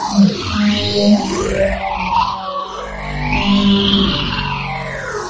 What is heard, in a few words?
droid trifighter engine sound; lego star wars